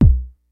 G-FUNK KIT, DRUMS; Kid Nepro; Elite

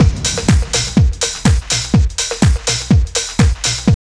house loop

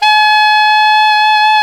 SAX ALTO MP, Alto Tube; Roland LCDP07 Super Sax

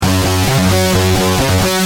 G Pump, Guitar; Industrial

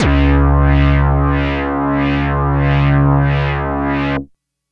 Phat Pulse, Synths, Pads; Sonic Foundry (Sony Creative Software); Electronic Point Blank

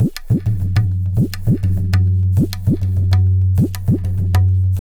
Tabla, 100 BPM; Pure Tabla; Zero-G

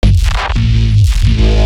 E Bass Shot; neuro; BIG5AUDIO

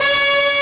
Time Up loop; J-League Soccer Prime Goal

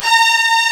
D#4, STRGS; East West; Global Sounds

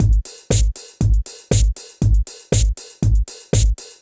PHATT BEA

